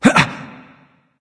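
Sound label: nonverbal surprised; smite; voicepack; ullr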